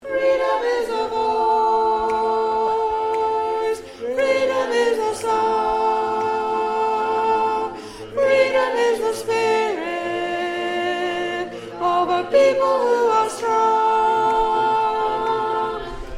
tenor

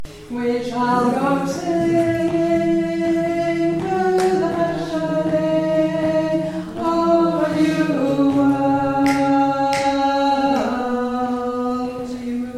Alto

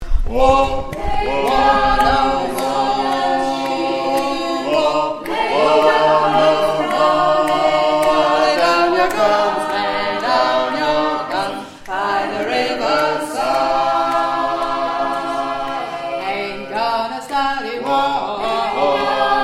Bass; Ain't Gonna Study War No More

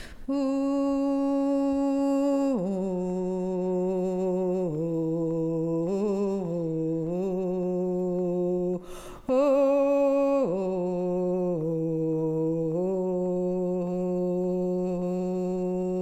Alto

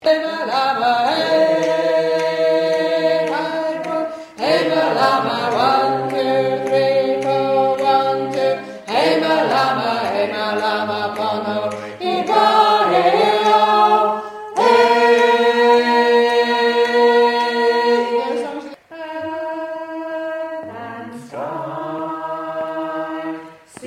Bass

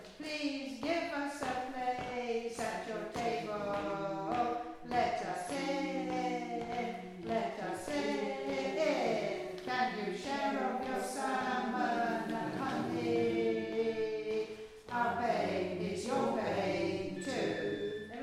let Us In word Rhythmn

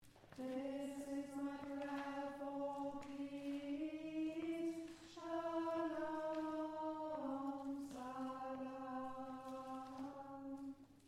Alto

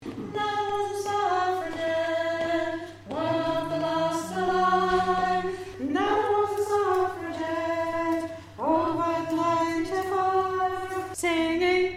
Chorus Alt

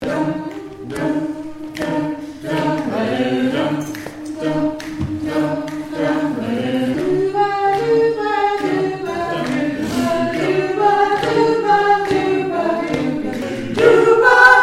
Warm Up

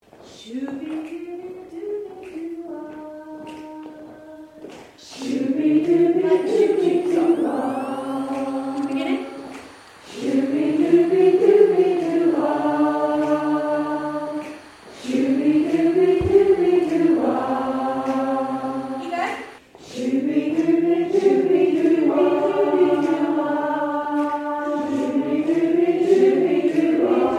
shooby dooby Round

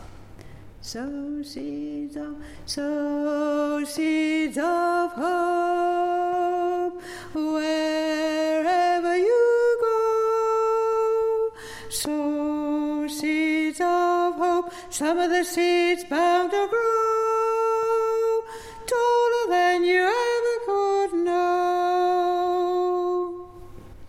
Upper

